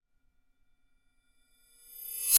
sound design metalic reversed impact realisation shock